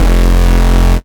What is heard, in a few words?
Bass Shot F